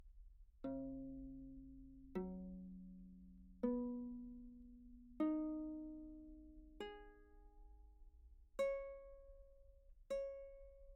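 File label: pizzicato open, treble viol; Anechoic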